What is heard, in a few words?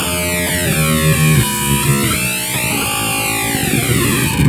phaser